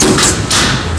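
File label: ambient clacky; filtration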